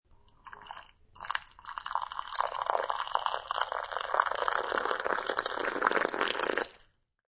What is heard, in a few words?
Pour Milk, Pouring milk into a bowl of cereal; Kitchen (Sound Effects:Household)